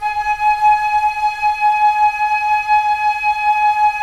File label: Flute, Roland LA Composer